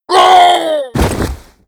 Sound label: Die